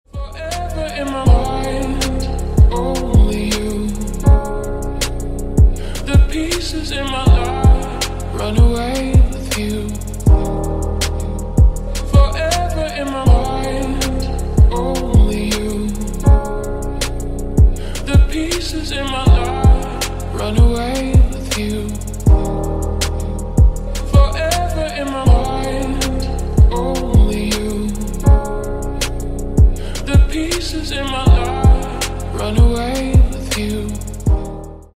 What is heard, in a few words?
instrumental